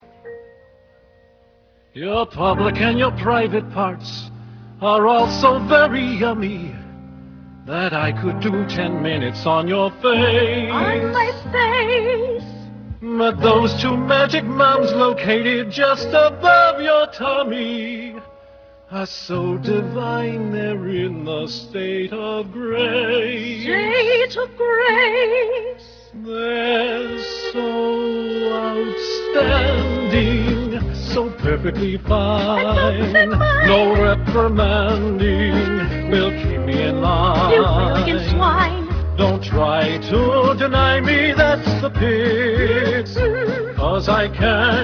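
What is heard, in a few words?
Brass Playing Mishaps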